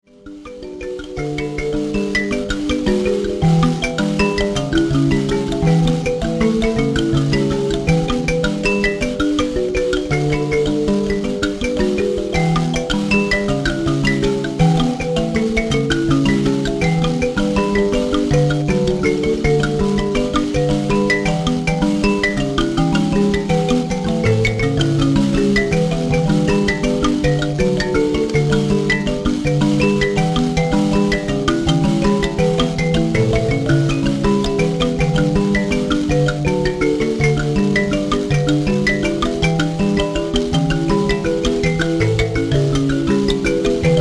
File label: mbira